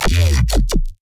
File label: Instrument Layer, bass